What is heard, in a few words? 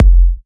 F, kicks; markus hakala kicks